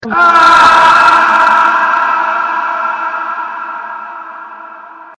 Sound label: laugh reverb